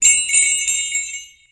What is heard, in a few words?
jingle bells